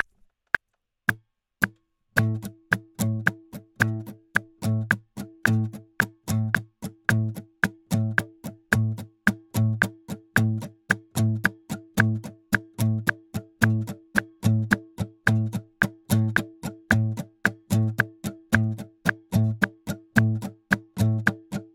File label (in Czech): metronom leva 110; Opakování Balicího sóla